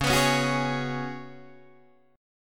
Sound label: Db 7th Suspended 4th Db down Guitar DADGAD